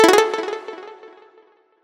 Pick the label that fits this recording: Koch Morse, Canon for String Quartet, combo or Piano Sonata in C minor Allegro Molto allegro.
combo